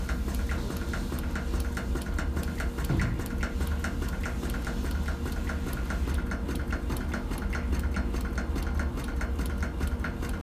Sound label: Pulse Generator Slow Pulse